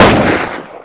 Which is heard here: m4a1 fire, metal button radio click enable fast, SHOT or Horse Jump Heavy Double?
m4a1 fire